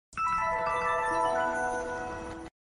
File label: flashback; cave